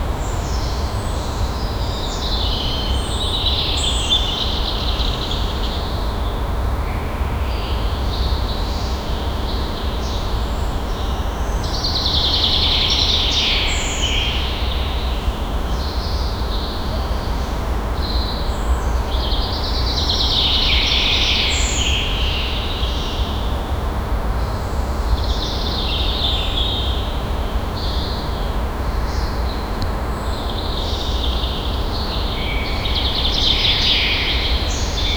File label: city neiborhood ambi, neighborhood